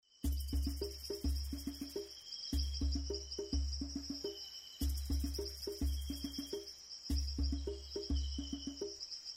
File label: bgm